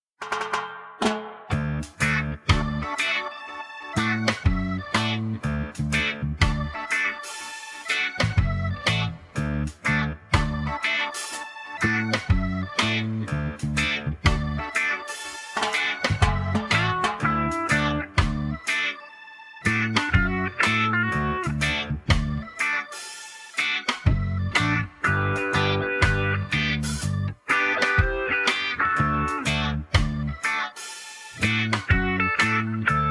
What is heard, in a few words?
backing track slower version; just instruments; SINGING ASSEMBLY